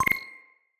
UI PM Received

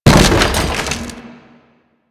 Wood Bench Destroy; Warframe; Ambience; NineteenNinetyNine

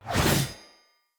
Sphere Dual Sword Throw; Weapons; Player; Swords

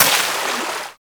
Water Sprayer Initial Shot; Warframe; Weapons

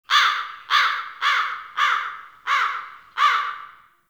Ambient Bird Calls; Ambience; Duviri

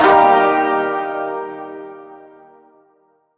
Shawzin Min Chord C; Chords; Warframe

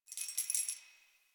Keys Jingle

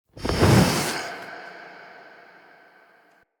Fire Push Cast; Powers; Fenn

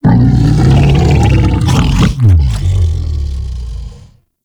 Helminth Feed React Happy; Liset Alchemy Lab

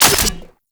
Alt Fire Mech; Warframe; Corpus; Tenet Quanta

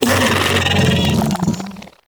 Bug Vocal Death; Characters; Warframe; Hunting Critters; InfKDriveBug

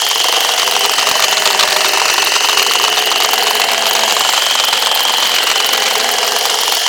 Infested Moa Nanite Loop

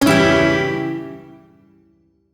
Shawzin Phrygian Chord; Warframe; Duviri

